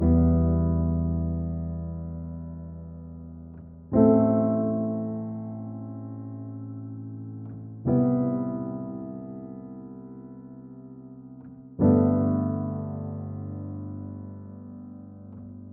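122 BPM Piano Chord Progressions Cinematic Drama C major A minor Tonic E; mmb studio melodic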